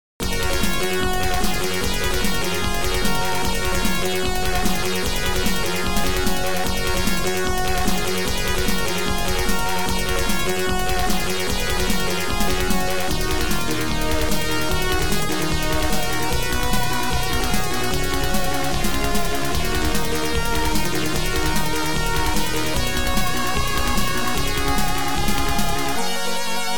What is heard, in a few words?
OPL2